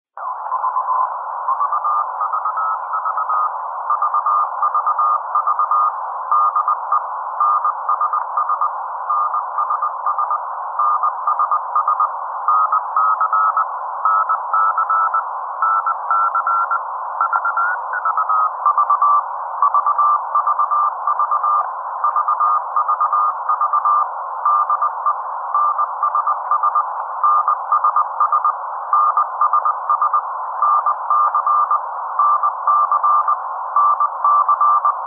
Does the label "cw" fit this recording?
Yes